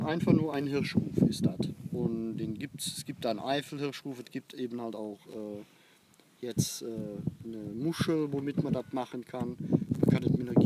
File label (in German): Hirschruf